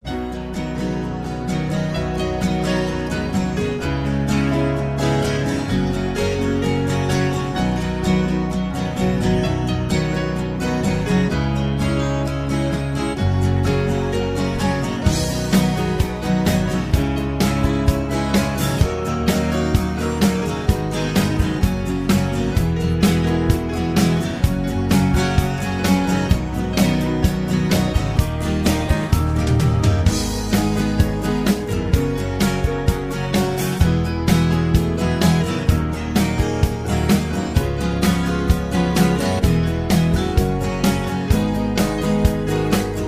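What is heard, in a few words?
Instrumental with background vocals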